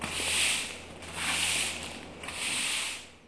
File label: floor sweep